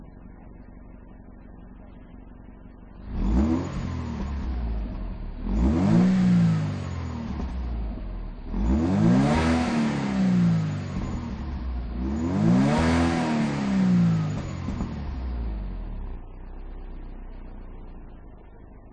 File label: Toyota FT 86 II Concept Exhaust Sound